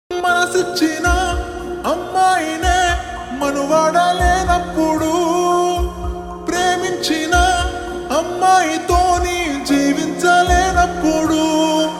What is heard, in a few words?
Slowed Reverb; Telugu